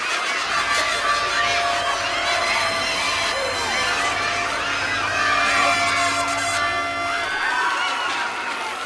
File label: celebration Tri Up; willyshotchillies